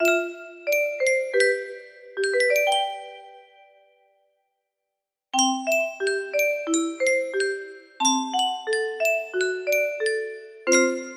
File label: music box, music box melody; cookie run music box